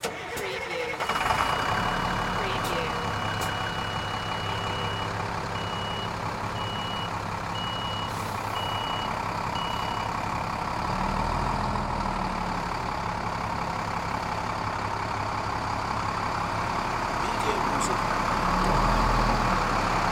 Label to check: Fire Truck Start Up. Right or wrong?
Right